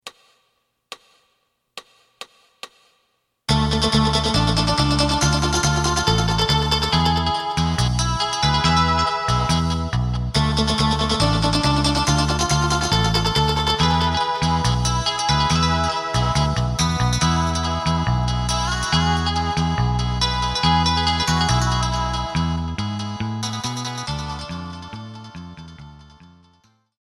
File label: drum